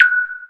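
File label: button, digital, single, ringing, short, high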